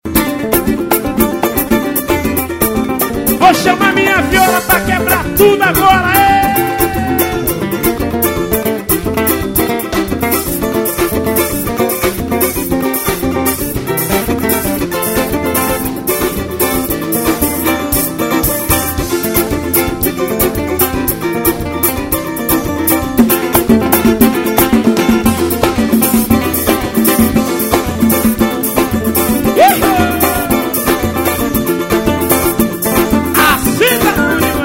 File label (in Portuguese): grupo samba